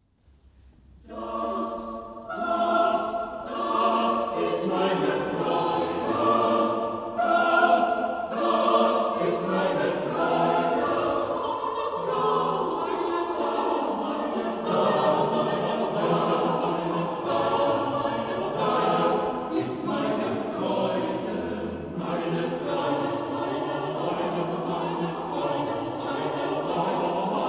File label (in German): vocale Track